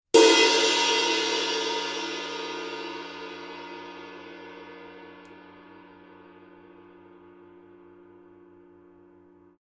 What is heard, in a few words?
22 blue heavy ride edge, paiste; color sound 900